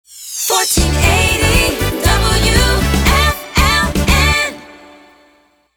Jingle Sting; WFLN 1480

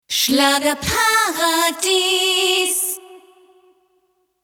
Schlager Jingle Acapella

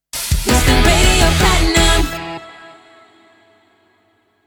Jingle E Key 165BPM Sting; Houston Radio Platinum

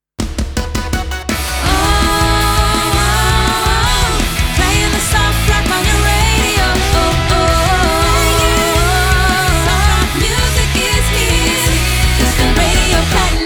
Jingle E Key 165BPM Slogan; Houston Radio Platinum

